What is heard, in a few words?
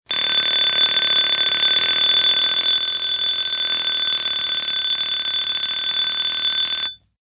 CLOCKS ALARM CLOCK: INT: Alarm clock ringing, small alarm, distinct hammer impacts.